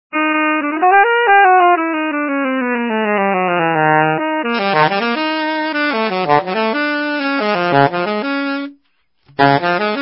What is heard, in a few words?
arpeggio, alto; gold; morgan